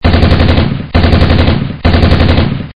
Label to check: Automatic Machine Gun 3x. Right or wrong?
Right